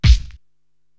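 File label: BD, bass drum, drum machine; Korg TR-Rack; Super DJ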